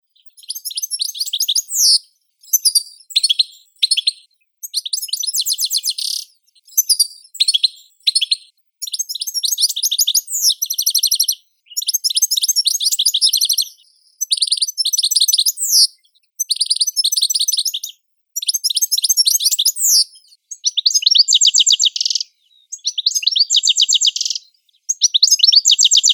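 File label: goldfinch, Carduelis carduelis; zvuki prirodi; shegol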